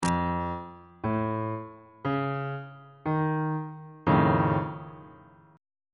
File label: f augmented 7th chord on bass clef